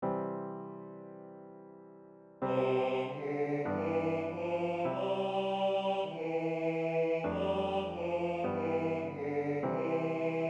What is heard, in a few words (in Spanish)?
Entonacion hombre coro juvenil